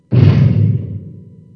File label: step